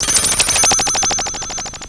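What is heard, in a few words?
FX right, attacks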